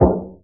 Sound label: Knock; QIKENGLIZONGZHUAN